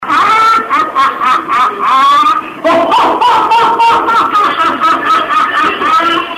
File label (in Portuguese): risada